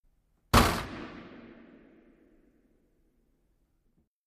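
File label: Hockey Penalty Box Door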